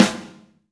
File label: Rock Snare, Roland BK-5; Snares